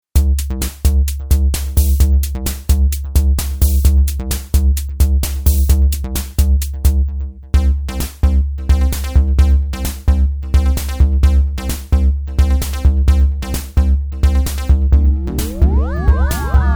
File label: electro; Rack Attack